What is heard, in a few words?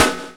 SD MISS; E-Lab; X Static Goldmine 2; akai